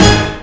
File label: Orch Hit C4, mt-32